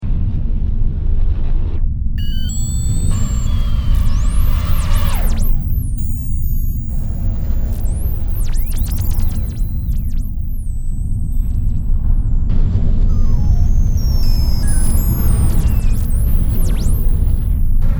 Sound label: Spaceship Ambiance, In-flight space ship drone with futuristic sounds.; Spacecrafts